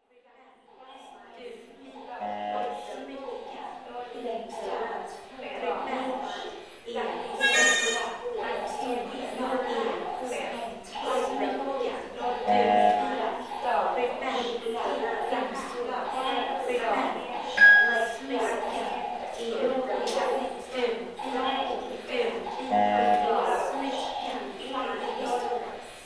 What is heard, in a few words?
mall